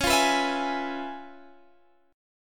C sharp 7th Sharp 9th Guitar Standard, chord; strummed; C#7#9 Chord; JGuitar

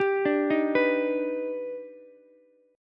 Eb Augmented Major 7th Eb down Ukulele; Eb+M7 arpeggiated; JGuitar

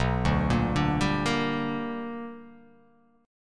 B Minor Major 7th Sharp 5th, chord

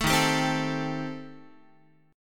D sharp 6th Flat 5th, D sharp, down, Guitar; D#6b5 strummed; 12,11,10,10,13,x

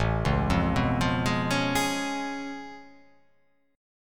A Augmented Major 9th A down

